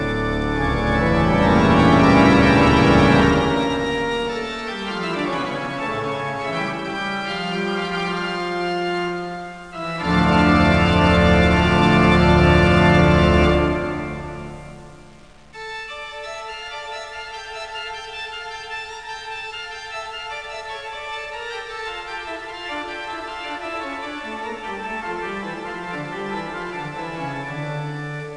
taccato

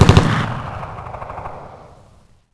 MP5 BURST; Holiday Software Sampler (Sierra On-Line) (1998); SWAT2DEMO

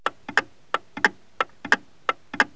Large Clock Pendulum, Household, Clocks; KABOOM! - Wild and Wacky Sound Software

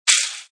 skate; hello kitty

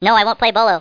Cajun Sound; The Ambrosia Times

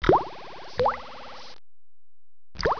PLOP

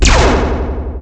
PLASMA; Shadow Master

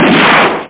Gunshot; Hottest 6 (1996)(PDSoft); animations

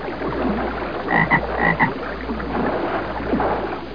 Grave Frog; Museum of Anything Goes

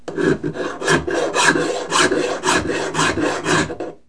Filing Metal; Construction

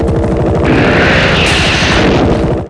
helo atms; PC Gamer IT CD 32

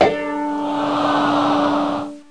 gong; TALKNOW; MEMGAME

